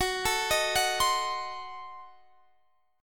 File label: D sharp Diminished 7th F sharp